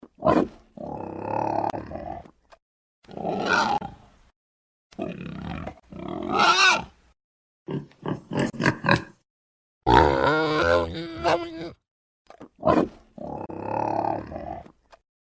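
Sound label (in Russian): zvuk kabana na ferme, sound of a boar on a farm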